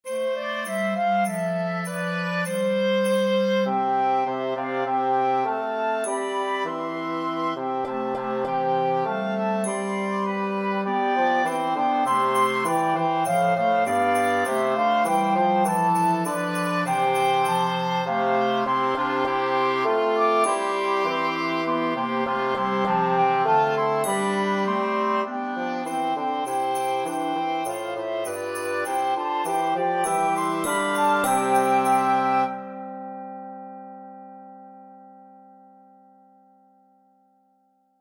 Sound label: Bassoon Track with Melody; The Holly and the Ivy